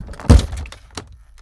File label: impacts source plastic robot heavy drop; robotic lifeforms 2